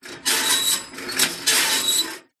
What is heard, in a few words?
household toaster metal rack slide movement close up; zapsplat